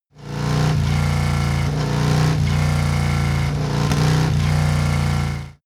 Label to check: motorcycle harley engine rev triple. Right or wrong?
Right